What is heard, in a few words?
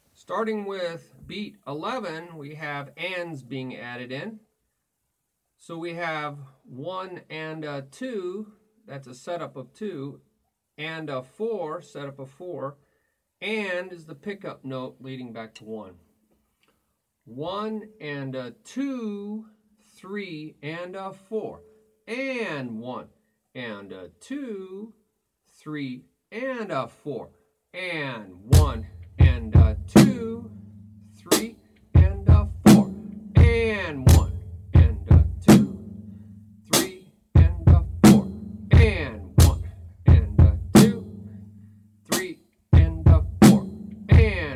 Beat 1 a2 3 a 4 Toom BaBaCha Ti BaBaCha Ba